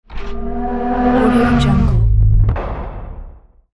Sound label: flyby impact